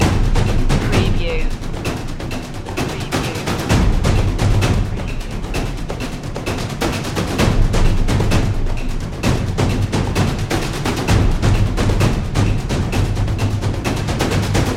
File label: trailer percussion loop